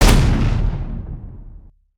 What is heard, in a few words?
charge